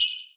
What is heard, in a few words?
Ring